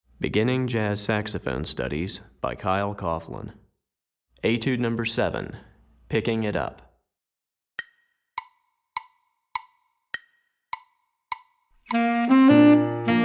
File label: Tenor Etude, Saxophone; Recordings For Beginning Jazz Saxophone Studies for Tenor, Volume 1; Etude #7; Pickup Notes